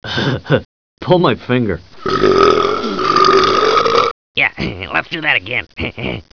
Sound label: burp